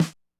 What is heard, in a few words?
Snare, classic machines drumtraks